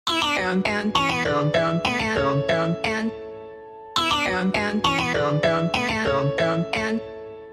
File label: Wednesday Nokia